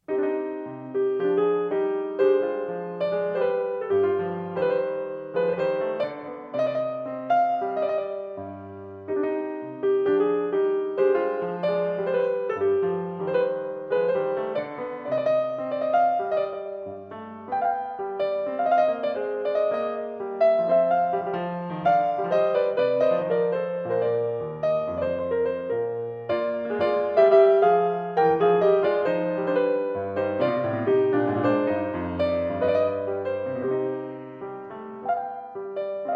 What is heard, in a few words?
gavotte et menuet